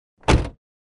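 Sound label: sound of a car door slam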